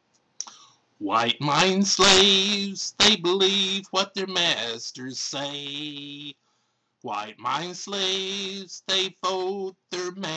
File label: song